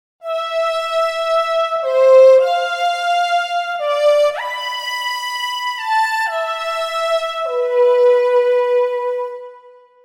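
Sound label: High String Ensemble; TURBO VL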